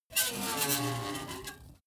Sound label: metal screech phaser fast